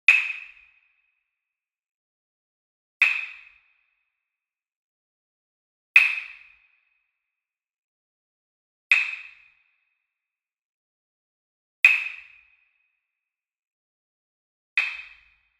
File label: Wood Percussion Wood Block Japanese Hit Medium; boom library; Cinematic Strikes Construction Kit